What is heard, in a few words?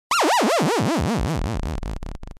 Retro 8 bit game over